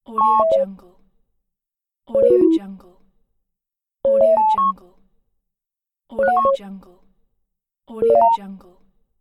8bit sweet warm; audioefx

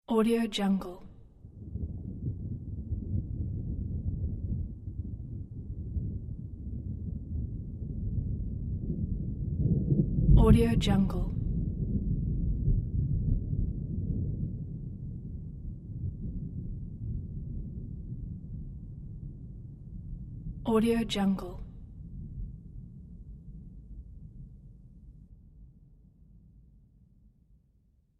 distant thunder rumbling

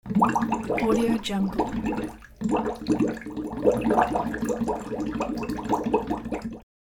bubbles; soundwood

